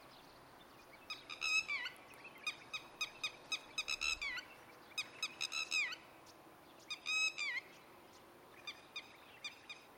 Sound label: Red wattled Lapwing Vanellus indicus, Ladakh